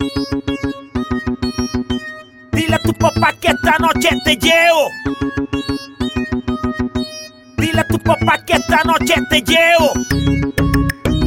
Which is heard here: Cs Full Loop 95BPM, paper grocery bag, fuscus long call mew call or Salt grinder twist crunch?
Cs Full Loop 95BPM